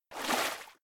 water splash, footsteps

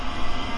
cyclops charge; abilities

